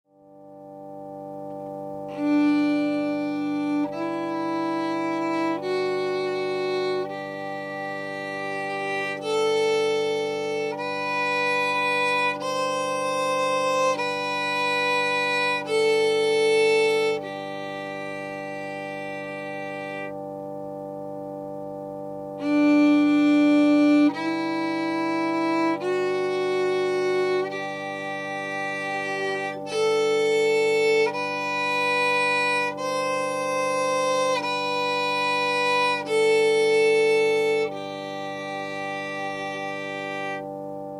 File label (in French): echelle